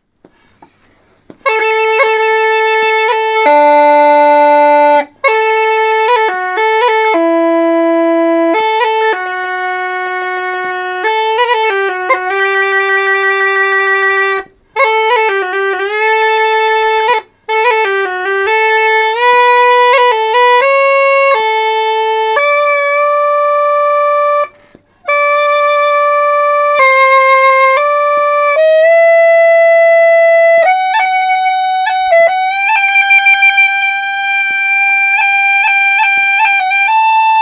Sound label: spruce reed